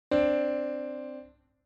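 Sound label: major second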